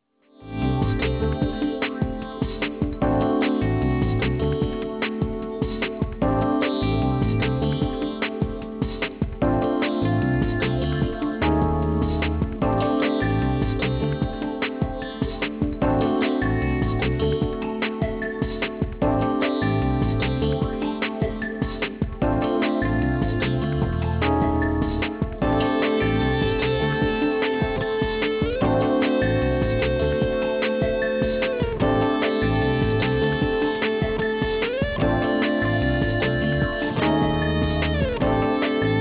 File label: Pop